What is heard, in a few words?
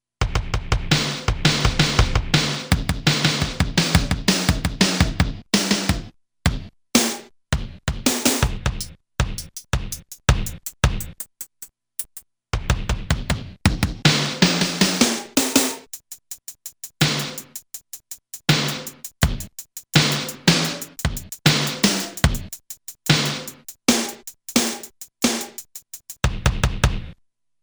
Mini drums